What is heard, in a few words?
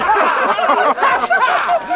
AUDIENCE LAUGHTER; people